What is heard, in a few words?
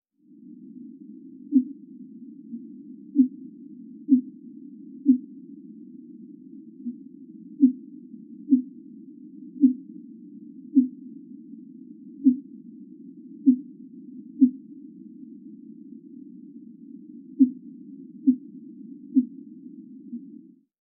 fin whale inner cable